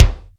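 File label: R-8 thin kick; SN-R8-05 Jazz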